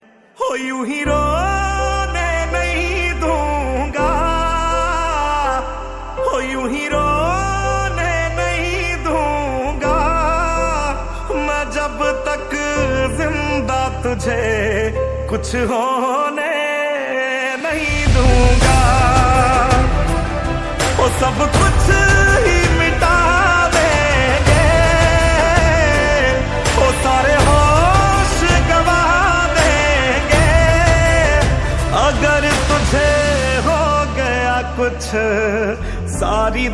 Hindi Ringtone

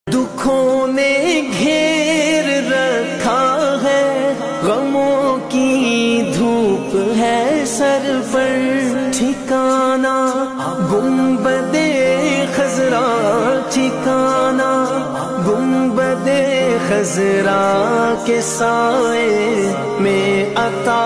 Naat Sharif Ringtone

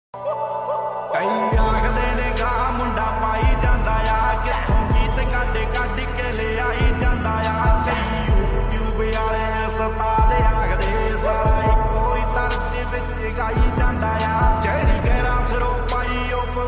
Punjabi Ringtone

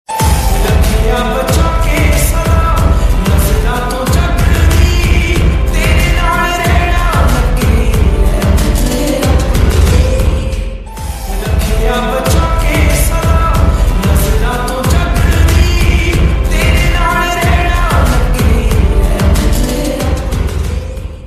Extra Bass Punjabi Love Ringtone

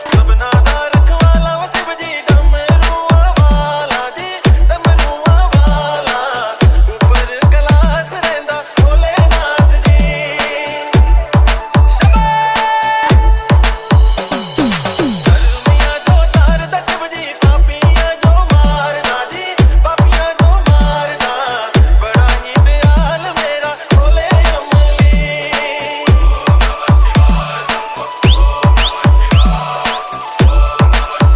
Dj Remix Ringtone

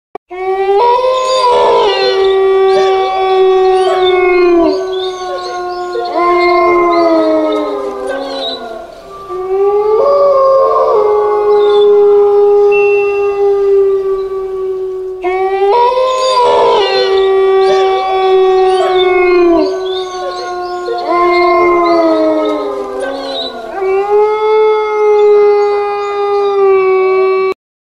Horror Scream Ringtone

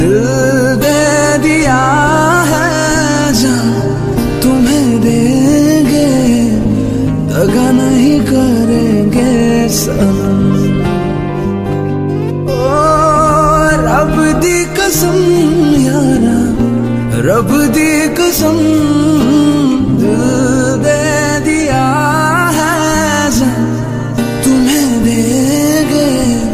Sad Version Ringtone

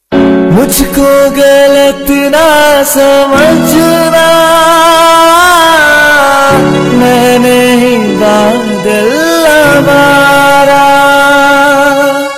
Sad Song Ringtone

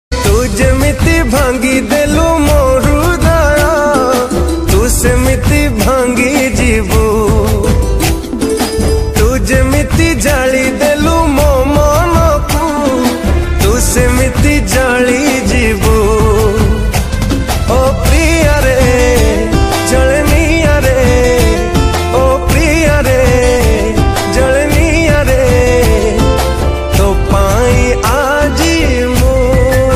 Odia Ringtone

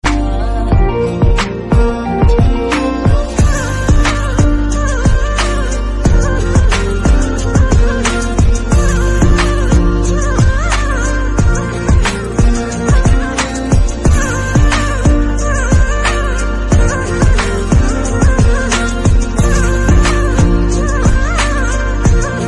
Instrumental Ringtone; ringtones

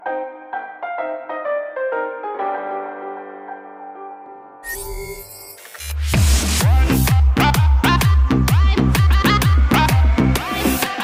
Vivo V15 Pro Ringtone; ringtones

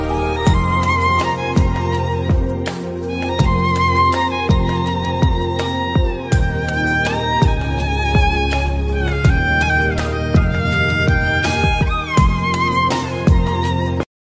Romantic Love Instrumental Ringtones

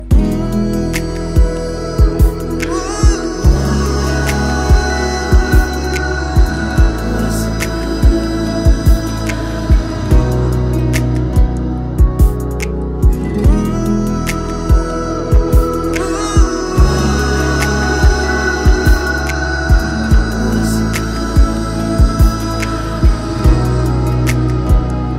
Sweet Music Ringtone for Mobile Phone; ringtones

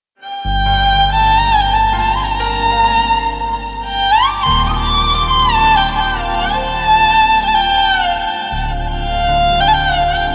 Love Song Ringtone

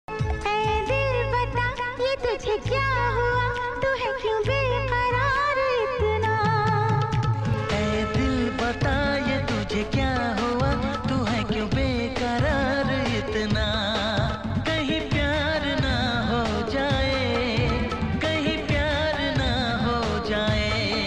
Old Hindi Song

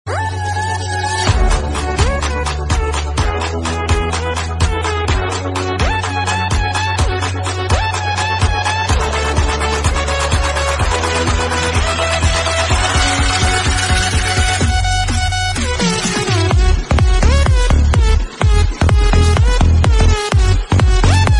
Tik Tok Ringtone

